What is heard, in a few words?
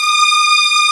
strings, arco marcato; Roland LCDP13 String Sections; STR Combos 1; CMB Arco Marcato